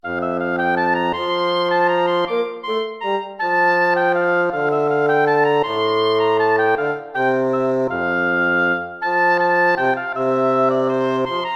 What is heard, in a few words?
lute